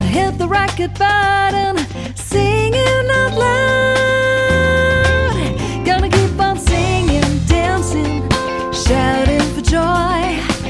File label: Singing out loud